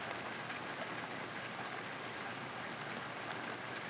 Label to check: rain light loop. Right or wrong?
Right